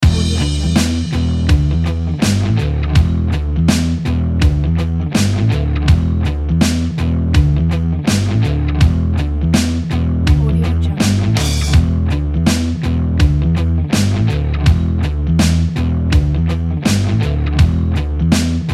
stylish powerful indie rock; oliverkobak

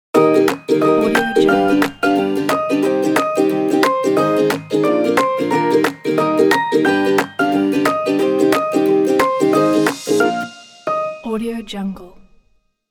the happy logo

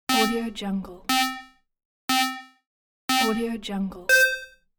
game start countdown

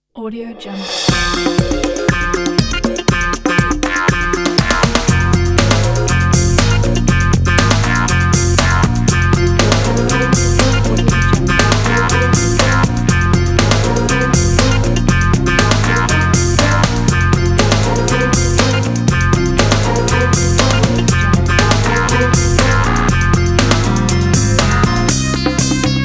upbeat funk news ident

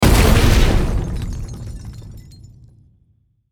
explosion with glass

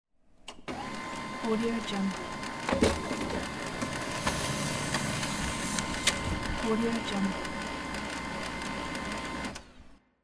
laser printer

